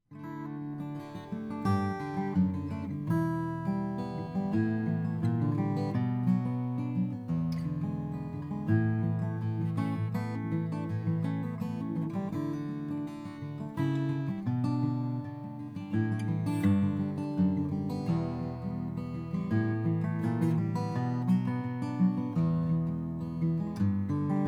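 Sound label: froggy bottom p14 brazilian rosewood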